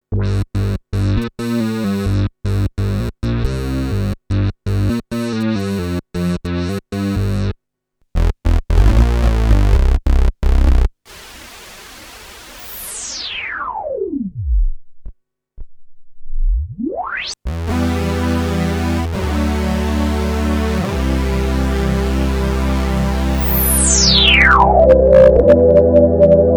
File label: roland juno 106